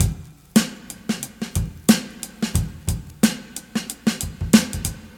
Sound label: 91 bpm drum loop G sharp key; drum loops